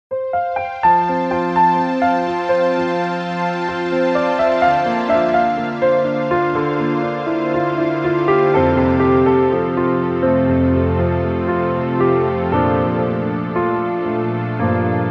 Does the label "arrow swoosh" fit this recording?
No